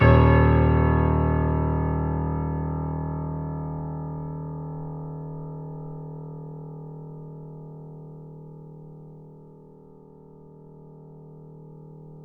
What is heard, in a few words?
Mf D#0, Grand Piano; AKAI S6000 CD-ROM - Volume 2; Grand Piano 256M